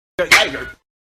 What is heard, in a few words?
slap sound effect